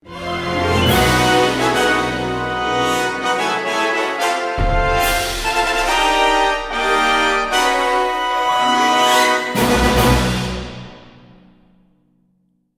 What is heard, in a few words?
Objective Achieved Stinger; Star Trek Legacy